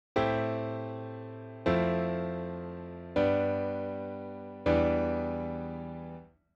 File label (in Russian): cepochka akkordov lya fa sol Mi